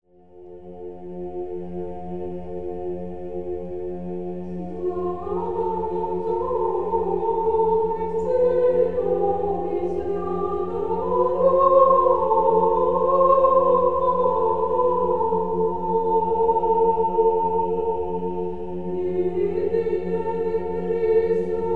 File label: Chants